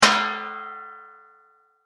Impact Metal Gong Frying Pan; Materials

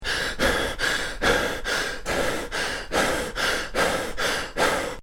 Breathing Male Out Of Breath Loop; human sound effects

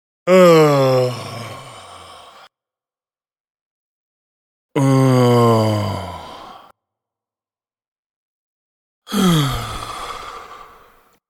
Groans, Male groans x 3; Groaning; Voice

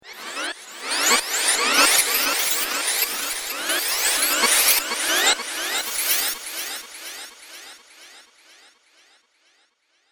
Random Data Hiss; Noise Music; electronic sound effect; Andrew Fleming

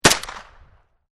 MP5 single, Submachine Gun MP5 Single Shot; Machine Guns; VRSonic

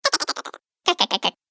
Cartoon Game Voice Cackle Sounds

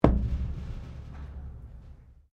METAL IMPACT RATTLE; Materials; Foundation

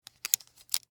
Foley, Sun, Glasses, Open; Dressing; Sound Effects:Human; BlastWave

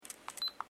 Camera Digital Canon IXY 60 Focus; Cameras; Electronics

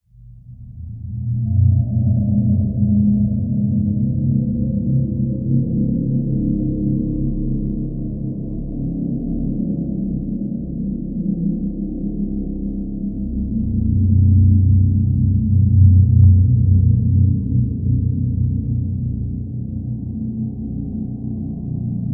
Drone, Cold, Dark, Cave, Demonic, Horror; 5.1

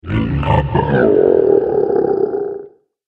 Massive Dragon, monster

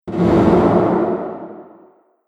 Horror Explosive Impact; Miscellaneous Impact Sound Effects

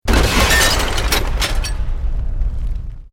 EXPLOSION MEDIUM METAL GLASS

